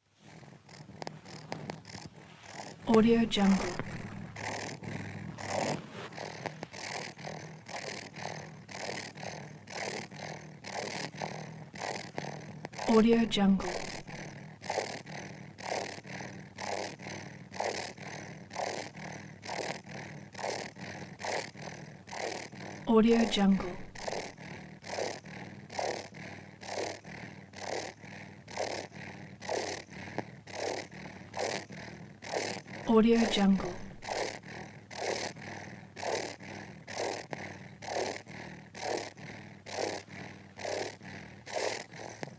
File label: creature wheezy fast pants purrs